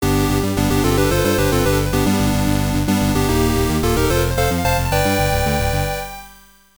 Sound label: Start BGM; Star Pulse; x68000